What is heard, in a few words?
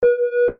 beep